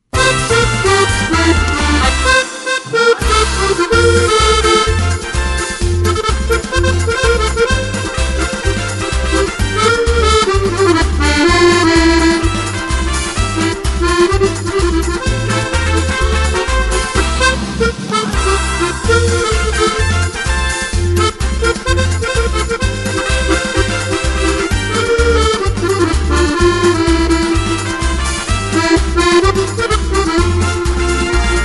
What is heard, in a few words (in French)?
samba